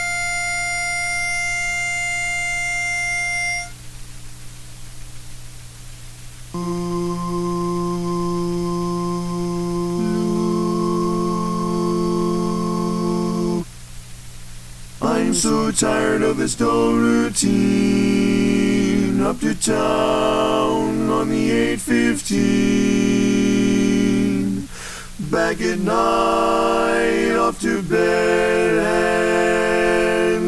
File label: lead missing